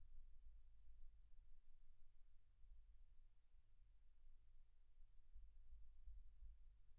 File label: silence 48kHz